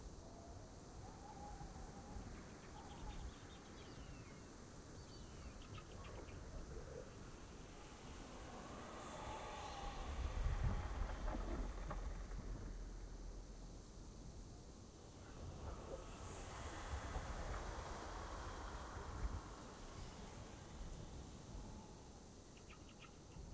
amb crypt; shurima

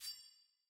content hover

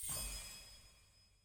homescreen offer hover; tft

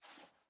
sfx ui generic section paper hover

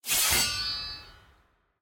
mini wings bronze; challenges

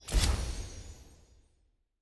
missions claim click

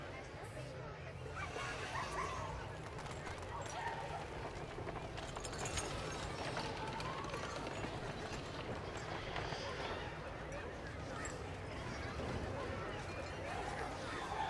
amb market; tft

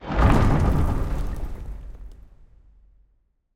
generic button click start game

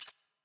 mute button click; premade voice

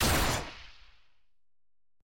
paah; pyke

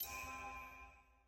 ui mini button click

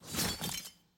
sfx lobby build in silver; regalia

